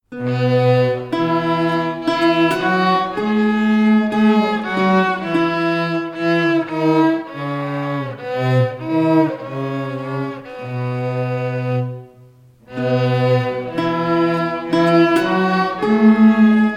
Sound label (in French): instrumental; amis entends tu